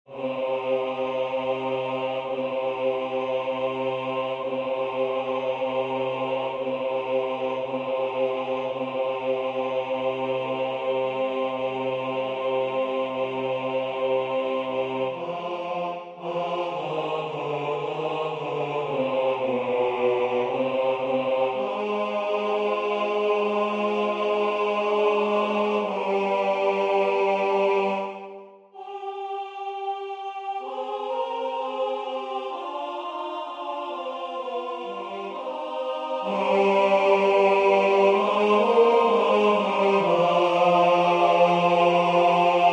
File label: Adoramus te Christe basse; Gasparini